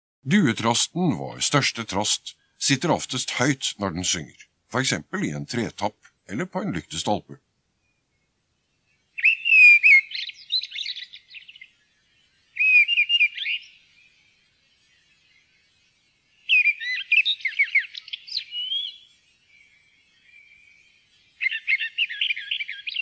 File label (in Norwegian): duetrost; Fuglene våre